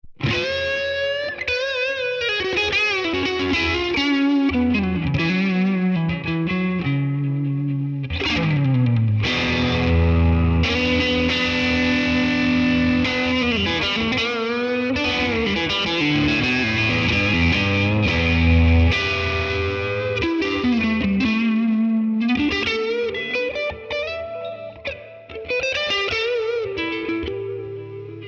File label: Tone King Imperial MKII Lead; Imperial Centre